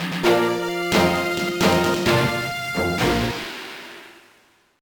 Victory!; Shin Bokura no Taiyou Gyakushuu no Sabata; GBA

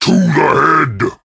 Swamp Monster Blinding Liquid

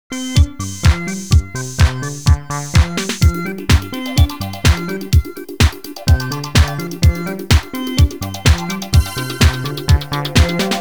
Music Disco; Drake of the 99 Dragons

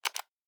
Click

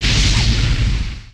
tornado fire; lego ninjago rise of the snakes

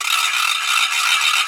Kart Lose Piece; pac man kart rally android

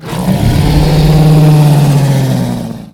maelstrom roar

